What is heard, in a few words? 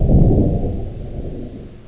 distant mortar